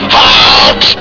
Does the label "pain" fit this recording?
Yes